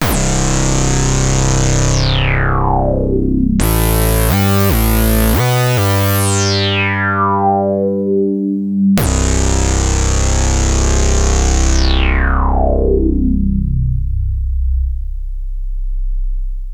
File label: Prophet 5